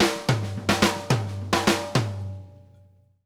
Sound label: drum fills 108; Sampleheads; New York City Drumworks VOL-1